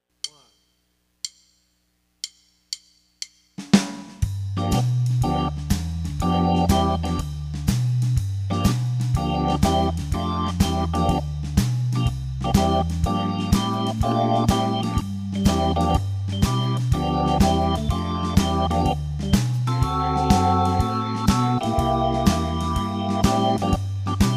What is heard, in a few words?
CX3 w Drums